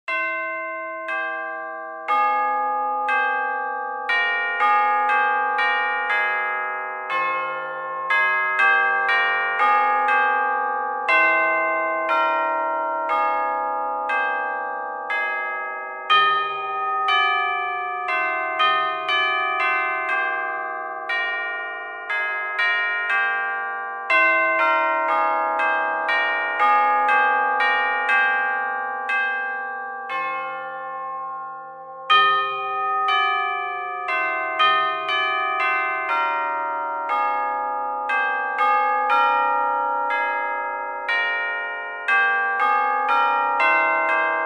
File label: Chimes Of Gloucester Cathedral